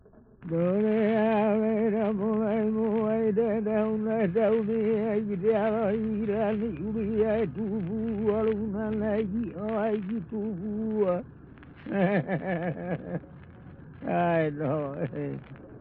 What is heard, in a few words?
Prayer Chant for life and healing, Hawaiian; World Music; Na Leo Hawai'i Kahiko - Voices of Old Hawai'i